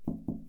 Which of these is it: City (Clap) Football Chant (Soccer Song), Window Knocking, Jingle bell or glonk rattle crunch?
Window Knocking